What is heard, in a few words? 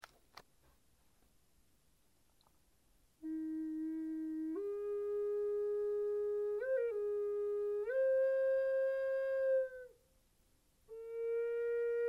amazing grace ~ flute